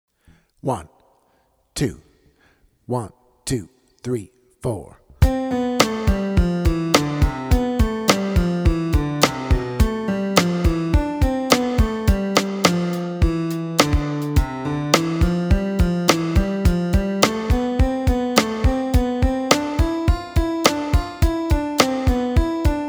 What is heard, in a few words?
triad etude backtrack